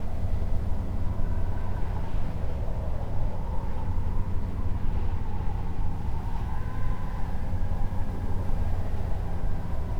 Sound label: Desert, ambience